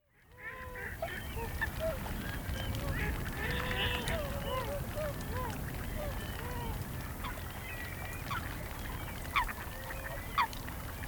Whooper Swan

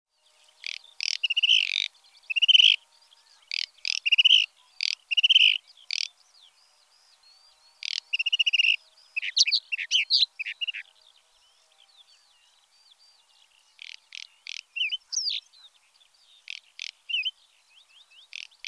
Whinchat

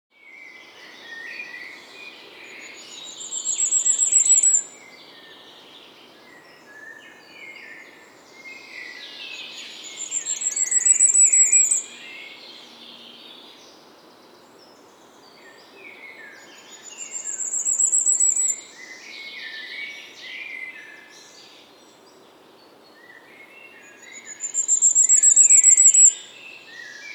Firecrest

